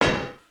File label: Spider Cage Yank; creatures organic